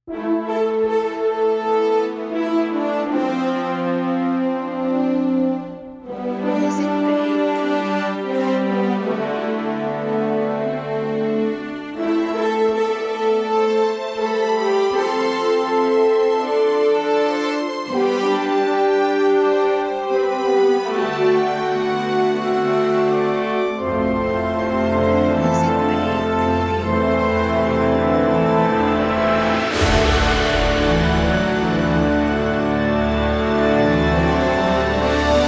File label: Heroic Adventure Emotional Fantasy